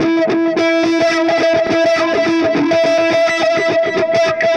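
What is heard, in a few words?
Delay Wah, Guitar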